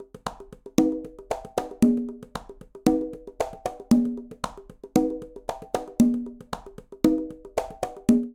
Afro Jazz Quinto Conga Tumba 115bpm; Latin Percussion; Ableton; Factory Packs

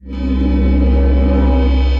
Drone Tapes, Ambient & Evolving; Ableton; Punch and Tilt

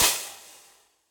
Crash FX18o16 Bright Room, Cymbal; Drum Hits; Standard Hits; Session Drums Studio

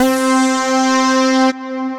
Alpha Brass

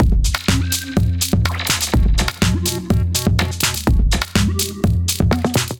Underwater Swords Kit 124 bpm; MIDI Clips; Ableton; Punch and Tilt

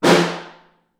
Snare Far

